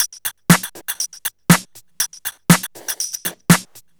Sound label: Minimal, 120 BPM, Percussion; Sonic Foundry (Sony Creative Software); Underground UK House & Electro